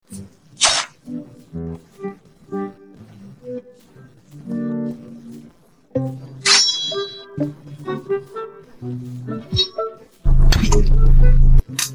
no vocals

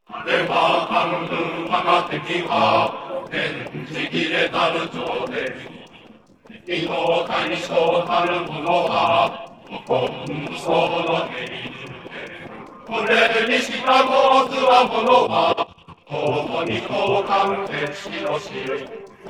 vocals; 日本古典音乐

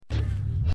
boom no vocals; made with voicemod

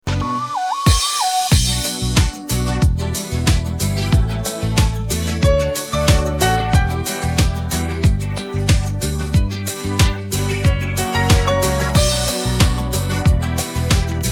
no vocals

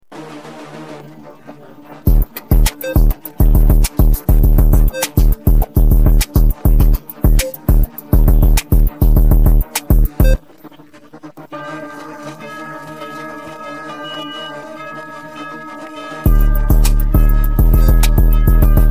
no vocals

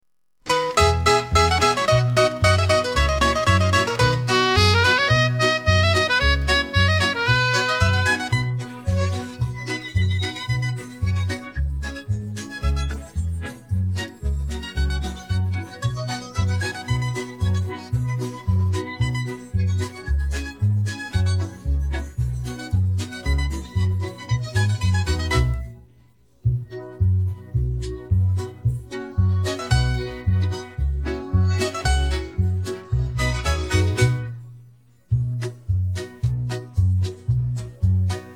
no vocals

